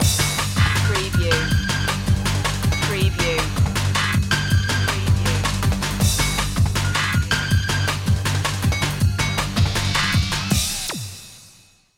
shock load loop

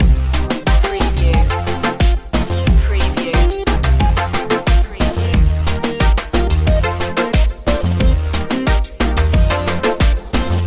moving reggaeton loop

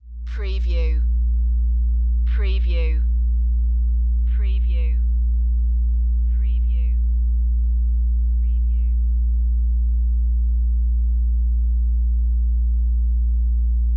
Scanner Drone; sound effects bible

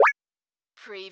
electrobubble

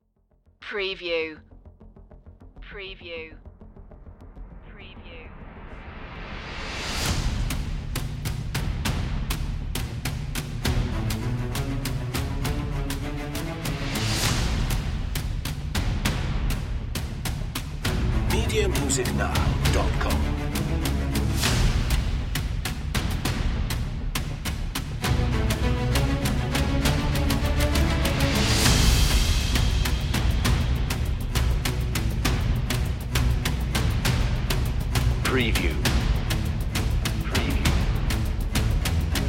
perc lo strings

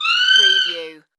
Kiwi Call

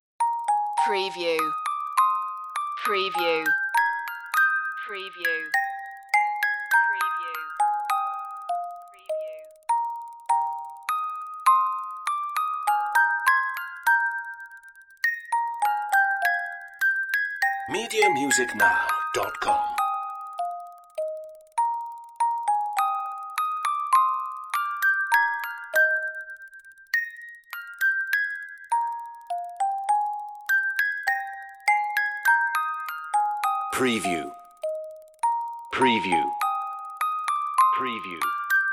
musicbox

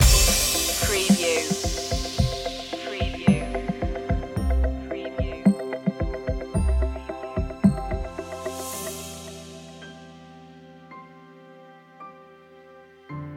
that feeling no vocals

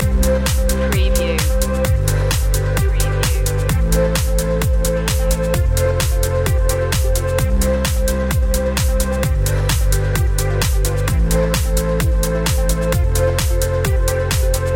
driving energy loop

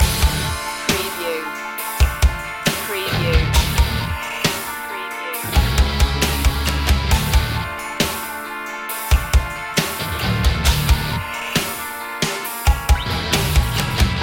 monster machine loop

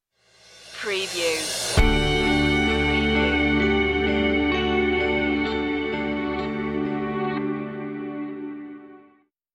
drifting stinger

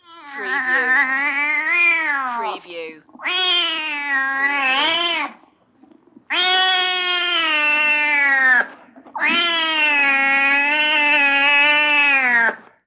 Cat Very Annoyed

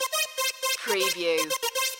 retro electro loop

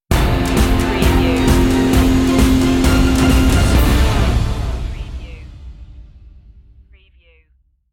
alive stinger

